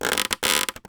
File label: Polystyrene Creak